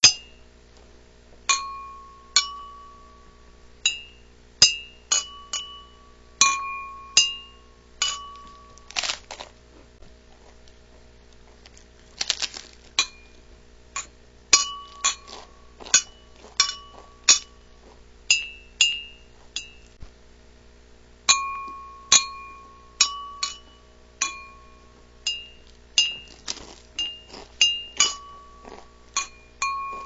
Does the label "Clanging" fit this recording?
Yes